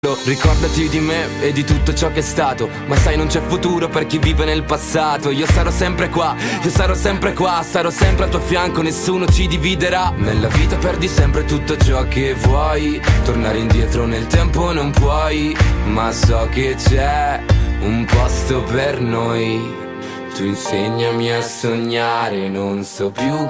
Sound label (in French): Carillon